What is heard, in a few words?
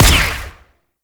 Pistol Silenced Shot; Operators; Drifter